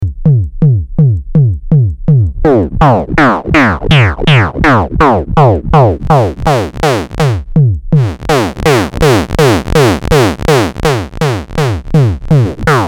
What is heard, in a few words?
259 Amp n Pitch Mod; Buchla; 259 vs 261e audio comparisons